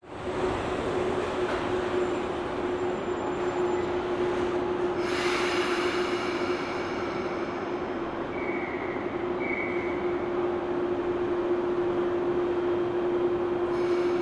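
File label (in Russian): метро поезд уезжает с открытой станции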